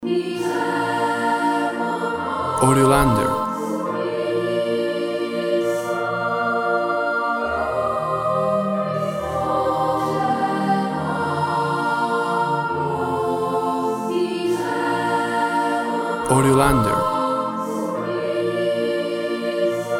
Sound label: Pastorale Choir